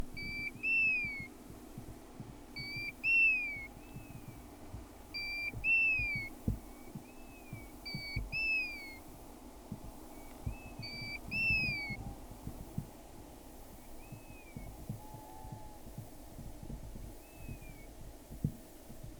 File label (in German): goldregenpfeifer, vogelstimmen; winfried krey; deutsches tierstimmenarchiv